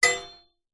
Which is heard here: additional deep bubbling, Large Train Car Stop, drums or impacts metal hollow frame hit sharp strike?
impacts metal hollow frame hit sharp strike